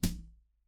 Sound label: impact thud